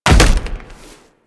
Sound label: Land Heavy Ice, Footsteps; Warframe; Tenno